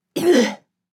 Young woman vomiting

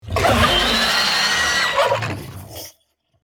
Velociraptor dinosaur roar attack

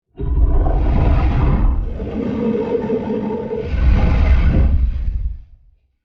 T-Rex dinosaur growl warning

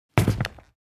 Pair of boots drop on carpet

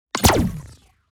Sci-fi weapon laser gun shot

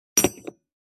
Metal padlock set down on wood surface

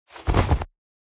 Jeans pants handling

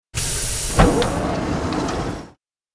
Train door opening; vehicle

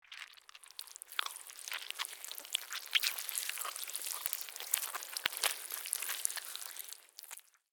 Worm slithering movement long; slug and worm

